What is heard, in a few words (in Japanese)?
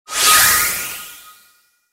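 防御魔法, 魔法効果音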